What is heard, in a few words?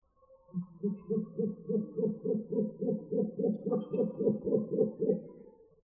male grunting, owl